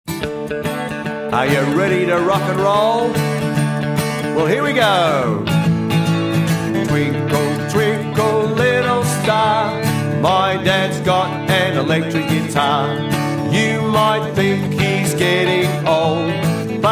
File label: twinkle twinkle rock guitar; musicroom